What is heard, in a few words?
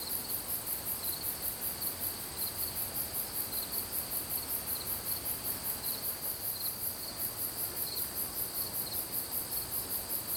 Crickets Loop; Dagath; Warframe; Ambience; Clan Dojo; Dojo Misc